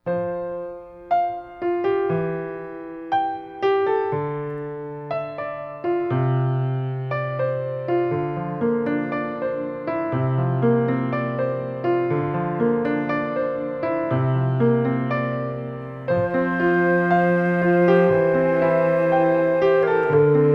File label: Quartet